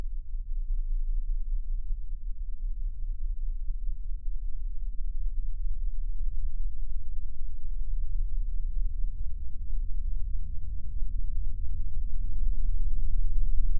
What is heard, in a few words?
Terminal Ambience; friday night funkin vs. dave and bambi